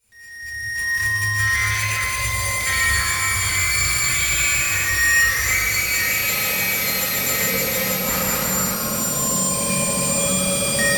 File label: Screech Digital Low End; Module Production Element Title Imaging; Imaging Elements